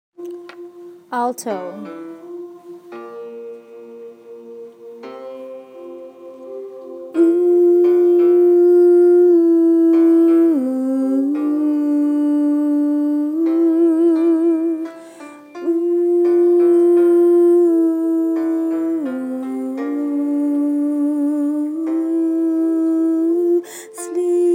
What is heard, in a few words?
silent night alto